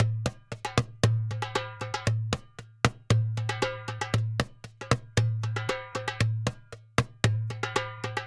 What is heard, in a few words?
Doumbek, Arabic; Sonic Foundry (Sony Creative Software); Continental Drift Aftershocks